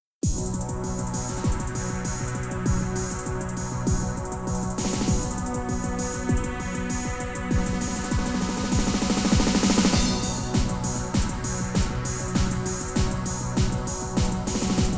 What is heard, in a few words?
Ambient ver.